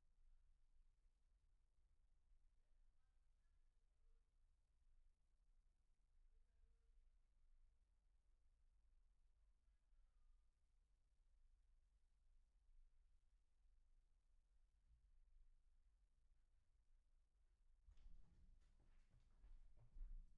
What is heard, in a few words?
TENOR SAX C